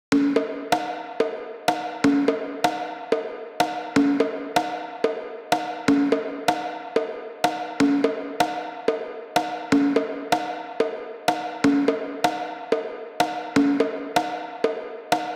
Conga Plate

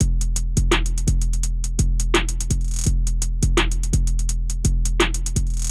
Add Swing

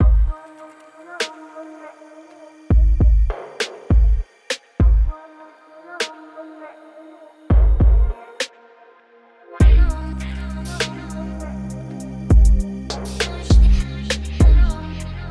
Prominent Kick Mix

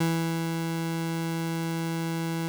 Square Wave

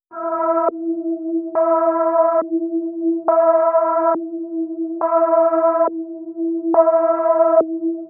LFO FILTER example; LANDR

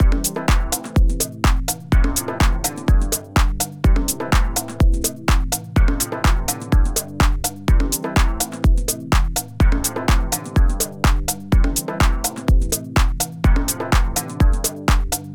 Loop No Reverb

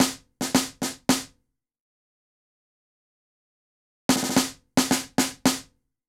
Snare Dry

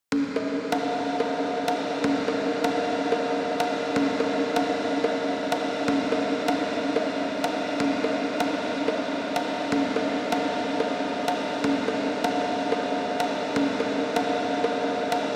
Conga Crazy Decay

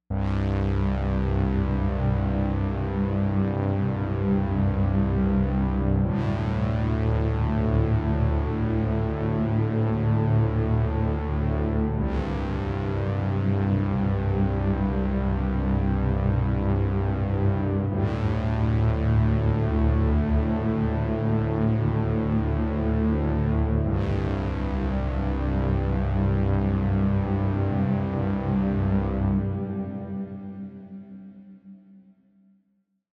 deep Synth; Adaptiverb

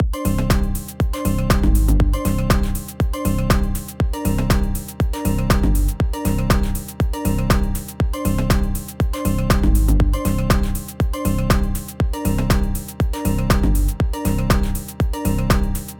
full pattern

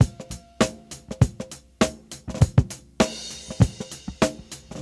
Reduce Ambience Off

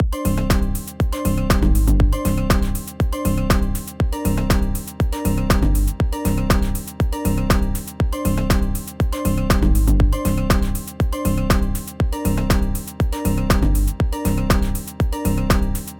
melody delay

